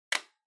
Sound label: Portable MD Close